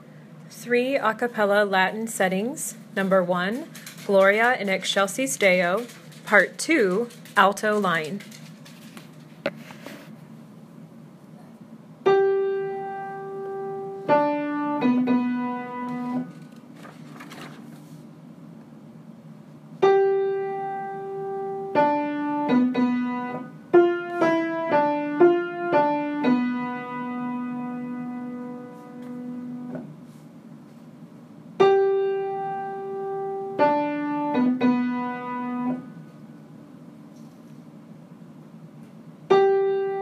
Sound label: Alto Line, A Capella; Latin; Gloria In Excelsis Deo; Three A Capella Latin Settings; Choir Parts